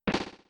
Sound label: fall